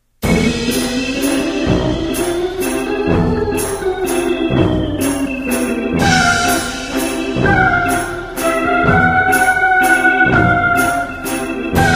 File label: game startup halloween; source engine; tf; holiday